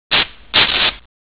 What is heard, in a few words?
zap